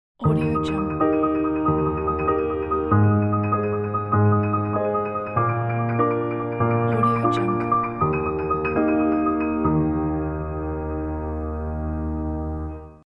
optimistic piano logo

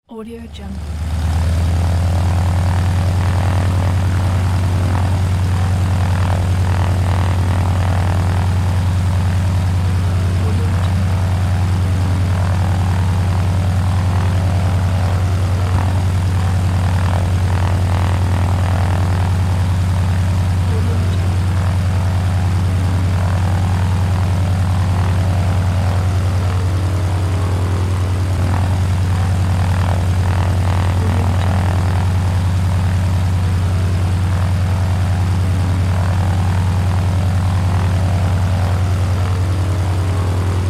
in old plane

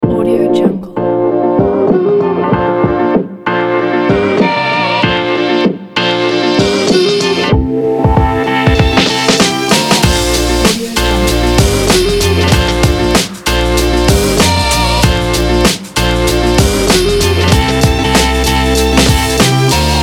hiphop

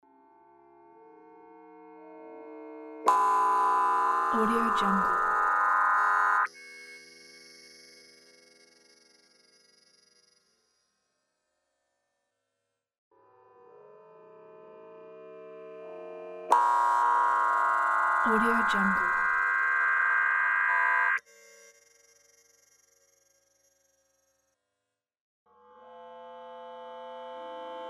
transforming high voltage

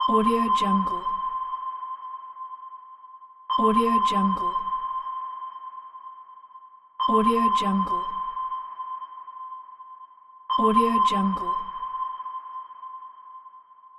sonar

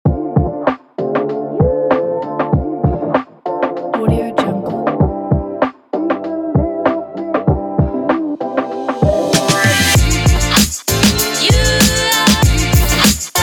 on the hip hop

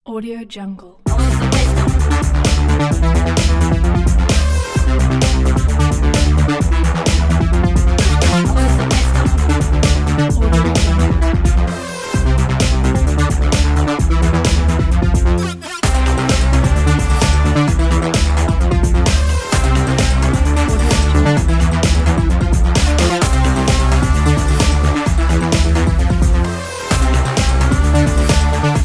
fashion electro sport

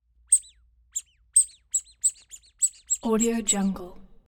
rubber duck; collanderson

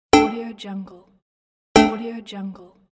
button

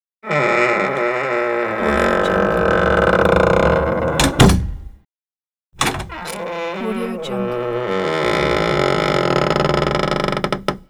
squeaky door opening and closing; electronplanet

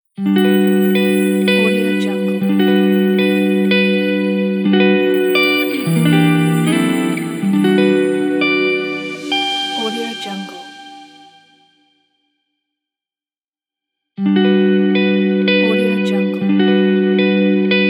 jingle bells christmas logo ambient

